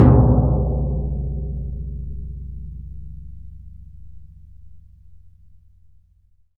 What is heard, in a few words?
TMP C#, Synclavier Percussion